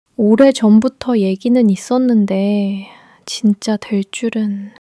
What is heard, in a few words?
struggle